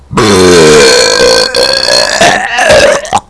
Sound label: Vomit